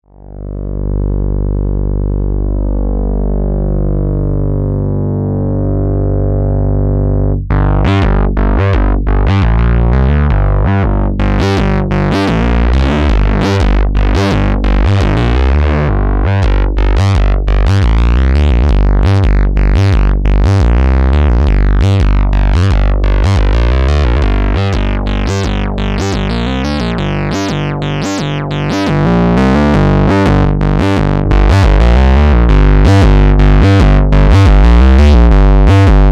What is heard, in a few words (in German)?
3 Oszillatoren plus Suboszillator; Model15